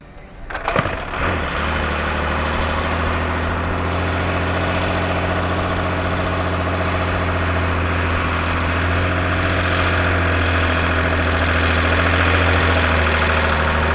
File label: Bell 47 Helicopter Startup And Idle